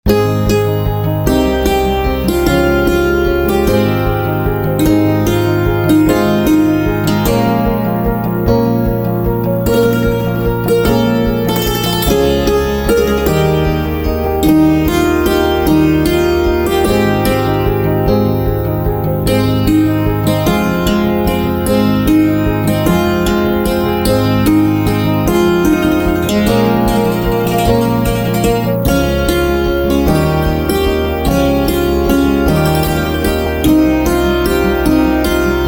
Santoor Instrumental

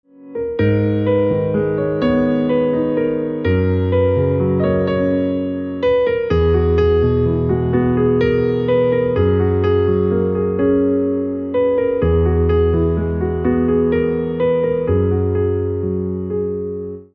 Piano Ringtone

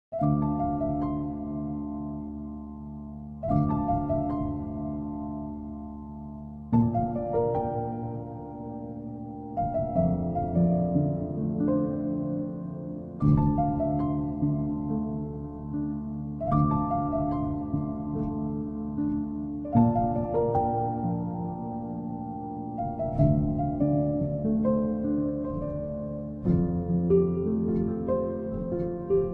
Silent Piano Instrumental Ringtone

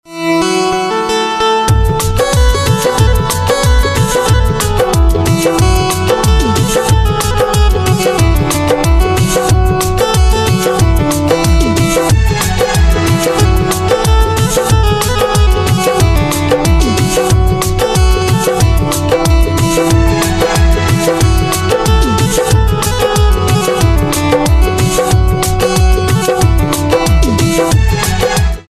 Banjo Instrumental Ringtone

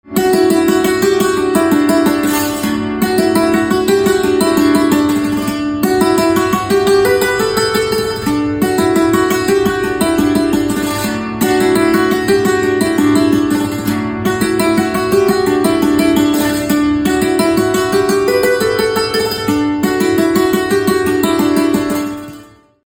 Santoor Instrumental Ringtone; punjabi instrumental ringtone

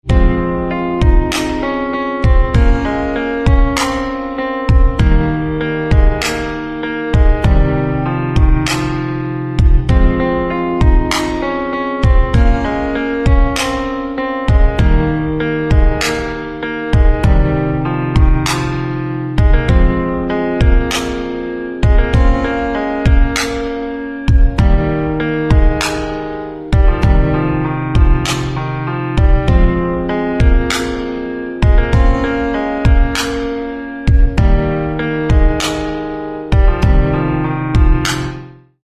Piano Instrumental Ringtone

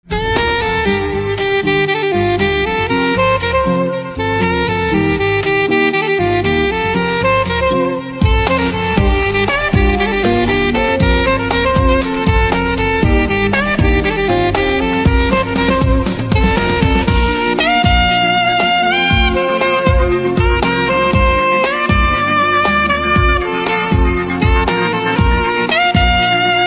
Saxophone Instrumental Ringtone

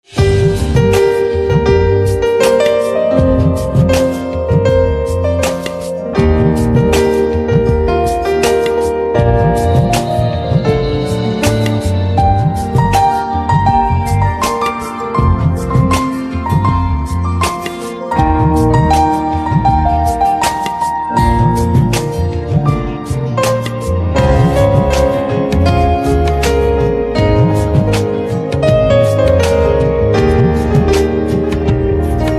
Piano Instrumental Ringtone

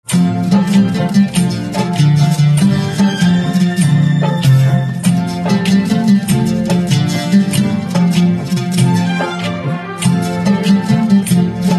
Guitar Instrumental Ringtone

